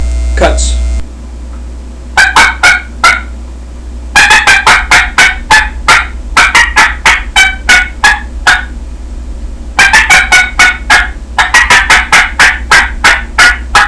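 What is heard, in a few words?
raspy triple cutts, calls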